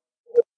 skype for linux camera closed

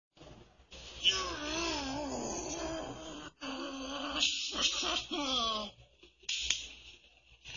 yhaaaaaaaaaaaaaar hahahah

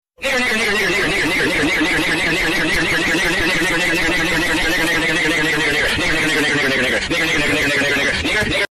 sick beat

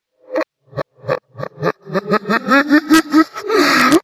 laugh

